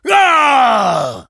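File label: pain crticial death, French; Engineer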